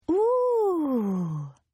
Oooh Female; Gasping; Voice; Partners In Rhyme

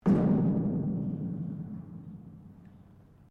METAL IMPACT HOLLOW; Materials; Foundation

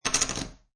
Poker Chips Drop Stack Of Chips On Wood Table; Gambling; Lynne Music

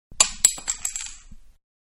Bottle Cap Fall On Wood; Bottle-Cap Falling Onto Floor; Drink Mixing; Bars

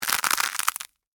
Texture, Crunch, Cereal, Honey, Oats; Crunches; Objects

